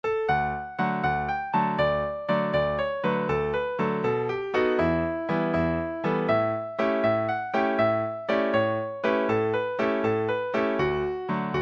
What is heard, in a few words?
jig; aunt marys canadian